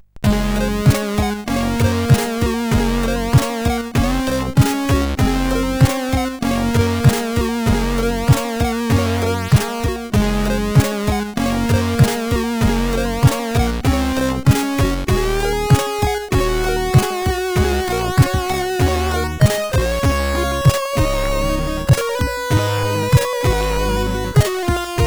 Dial Tone, sid, CSG8580R5

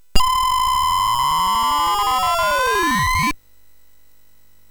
Final Synth Sample, MOS6581R3; hvsc

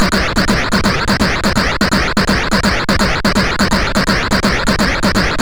Trance, CSG8580R5; Trance Sector Ultimate

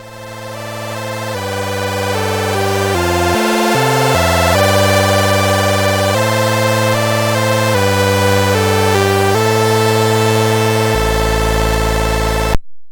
Chords2, MOS6581R2

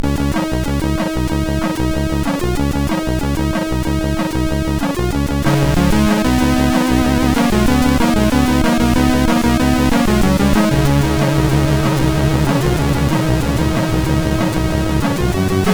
Vocal, sid MOS6581R4; Jewels

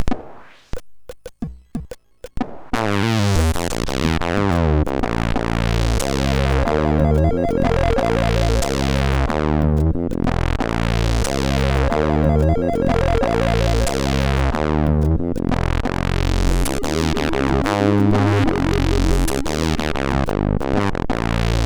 Funktrap, MOS6581R4; hvsc

